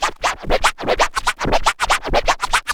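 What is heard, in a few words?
SCRATCH FILLS; AMG Megabass REMIX! VOL-1 & 2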